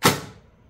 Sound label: airsoft; weapons